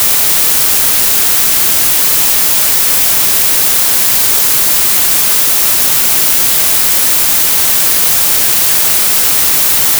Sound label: Sine 622; 48k; LR; nodither; 0db